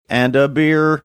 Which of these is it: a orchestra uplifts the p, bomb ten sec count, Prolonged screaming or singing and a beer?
singing and a beer